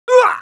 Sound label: damage, pain; mohaa; dialogue